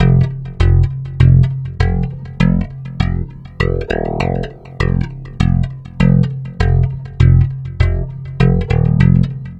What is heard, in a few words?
Stick Loop, Stick Bass